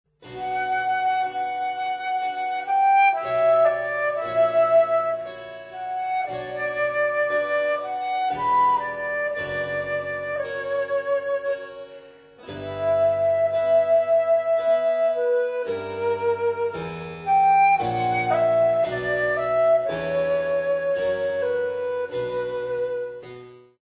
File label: Sarabande; Tartini; repertorio para bodas